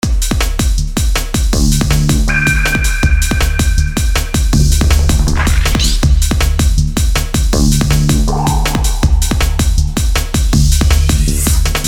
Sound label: BASS